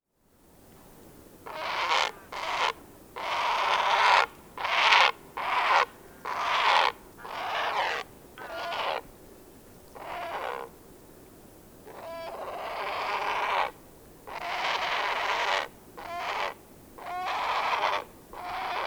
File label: Great Blue Heron Sounds; All About Birds Cornell Lab of Ornithology